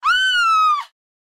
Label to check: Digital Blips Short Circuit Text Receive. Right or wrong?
Wrong